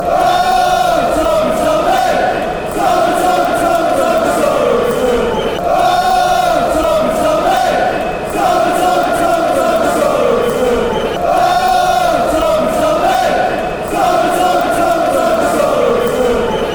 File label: fanchants; tommy sorenson